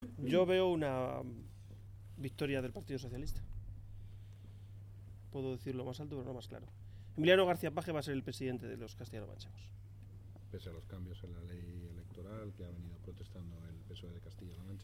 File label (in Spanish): pitos y aplausos; pitos se transformen en aplausos